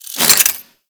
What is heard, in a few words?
Whip Equip; Grineer